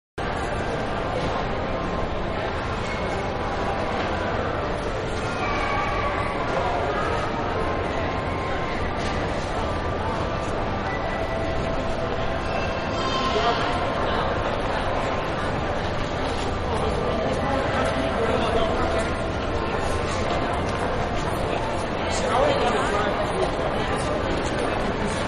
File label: Shopping Mall Ambience Stationary View; Shopping Mall-Ambience, Static View; Stores and Malls; Manhattan Production Music